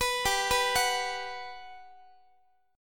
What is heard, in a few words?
G Major 7th, G, down, Guitar; Listen to GM7 arpeggiated; GM7 Chord